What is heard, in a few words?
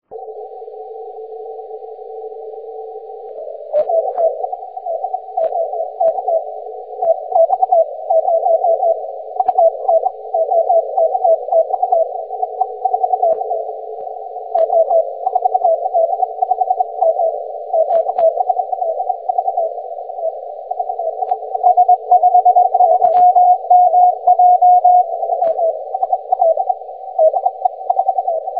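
6m continuous wave; 4l5o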